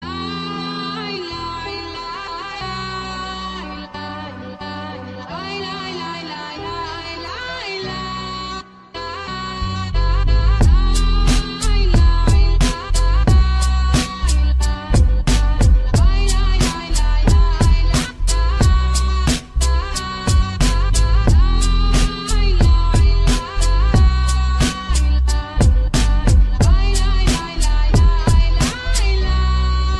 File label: Beat; Akra; meloboom